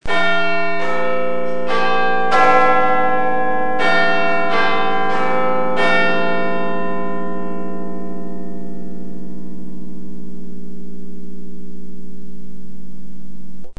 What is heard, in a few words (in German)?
Big Ben Halbstundenschlag